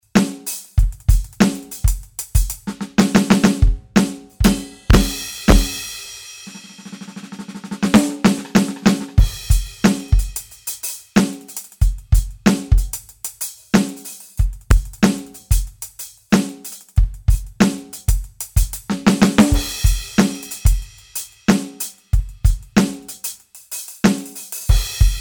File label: SIMMONS SD200 Kit1 Modern Maple